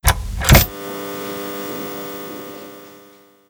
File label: results appear